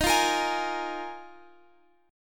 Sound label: Eb Suspended 2nd Suspended 4th, Guitar Open F; strummed; x,x,15,12,13,15